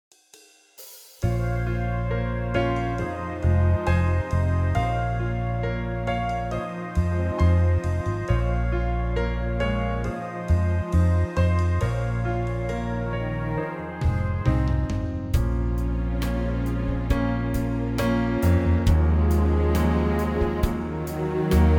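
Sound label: Bbm, Backing Tracks for Male Vocals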